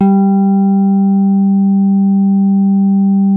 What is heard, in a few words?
SIDE SOFT G3, SYNTH PLUCK